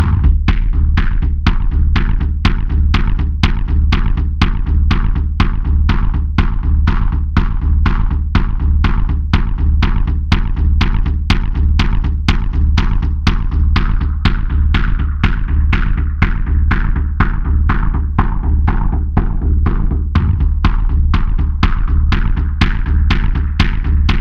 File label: pulse